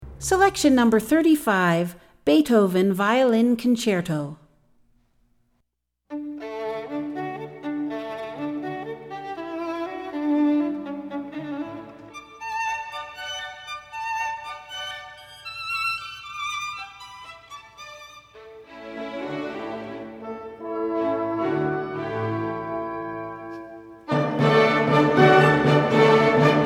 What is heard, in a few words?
Violin Concerto